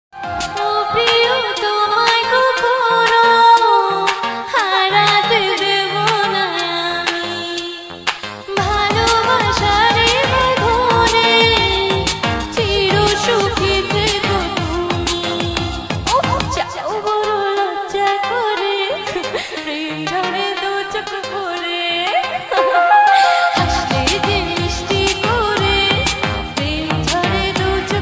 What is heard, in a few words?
love song bangla, bangla ringtone, gaan music, bangla song ringtone